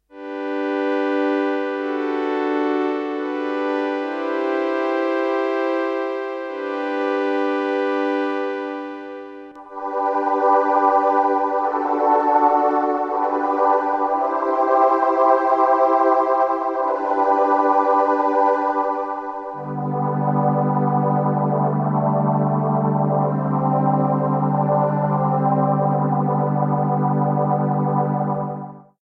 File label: string ensemble mideq chorale; jurgen Haible; triple chorus; synth